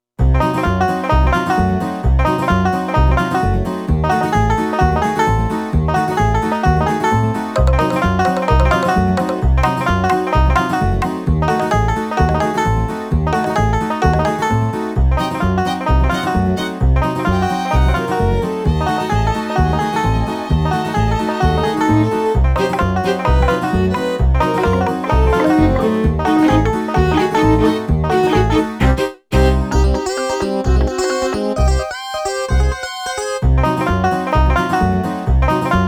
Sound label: music cave bpm130; Donkey Kong Country Returns HD